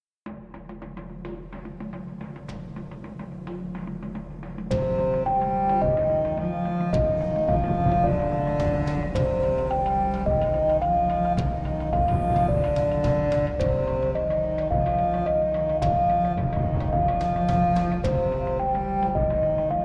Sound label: Futuristic Music